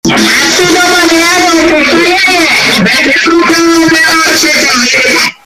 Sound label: loud hard scream